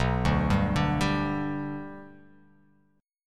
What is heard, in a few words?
B Minor Sharp 5th